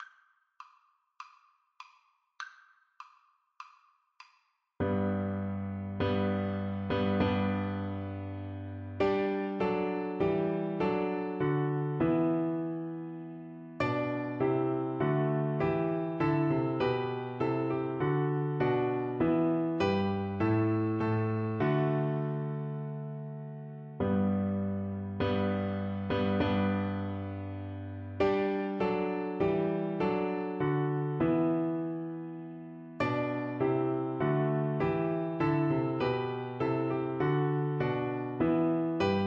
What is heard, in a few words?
March D, Recorder